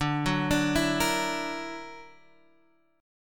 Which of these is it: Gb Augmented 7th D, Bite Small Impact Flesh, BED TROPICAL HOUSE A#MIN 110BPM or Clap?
Gb Augmented 7th D